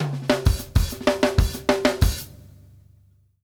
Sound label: SHORT HAT, DRUMWORKS FILLS 96